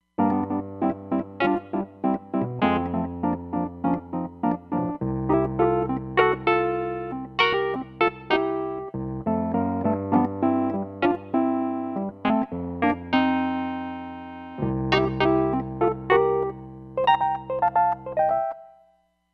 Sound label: Simply Wurly, Roland Fantom XR